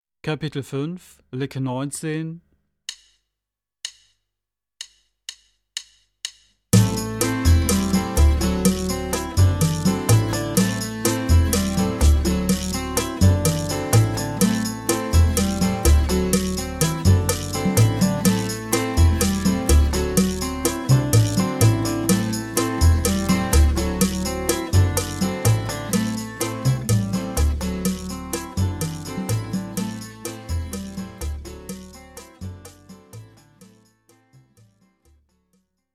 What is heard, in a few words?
Latin Style Guitar